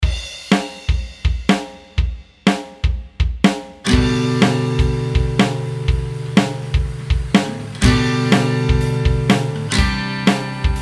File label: acoustic check